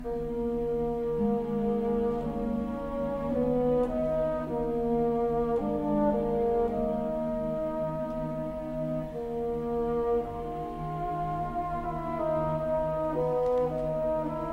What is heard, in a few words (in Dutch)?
fagot berceuse